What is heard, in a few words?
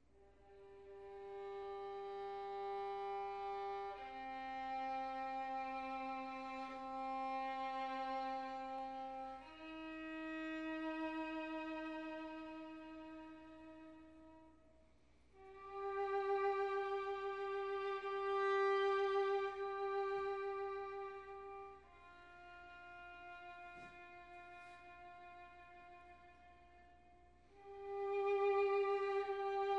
vn tape; elecro acoustic